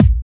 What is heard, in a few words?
KICK, Techno 138 BPM; Best Service ProSamples vol.54; AKAI